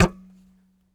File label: Very Short Note, Melodica